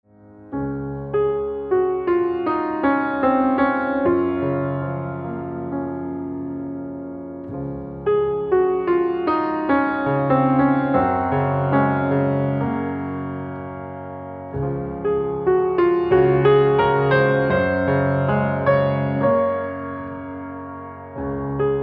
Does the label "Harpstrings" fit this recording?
Yes